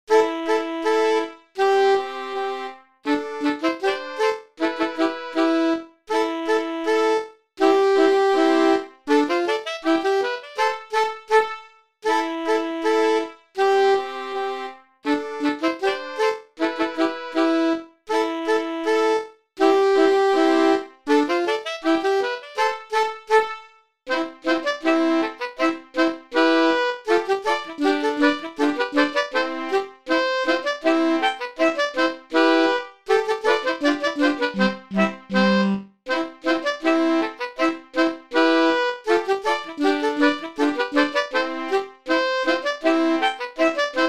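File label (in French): scottish ultra debutants sax clarinette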